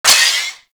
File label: Lantern Power Up Short; Warframe; Emissary Season Two